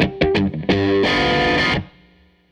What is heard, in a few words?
Tele Guitar Loop